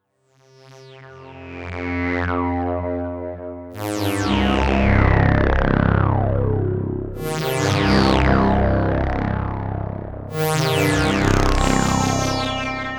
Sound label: Korg Prologue Dirty Ring Mod